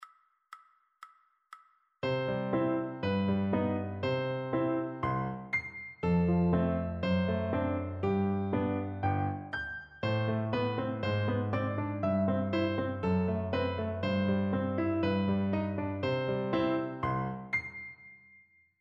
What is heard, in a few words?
duet, 120